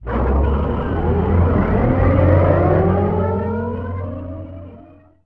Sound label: power on